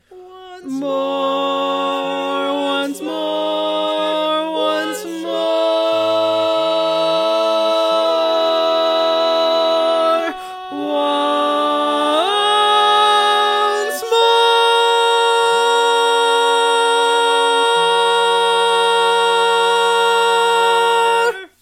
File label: Bari, barbershop